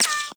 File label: Bullet Impact Ricochet; Warframe